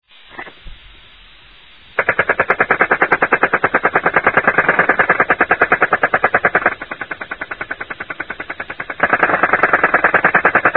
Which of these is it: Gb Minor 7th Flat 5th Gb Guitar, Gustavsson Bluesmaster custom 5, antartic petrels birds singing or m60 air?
m60 air